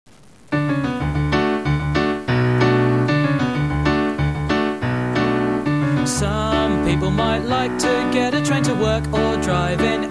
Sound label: music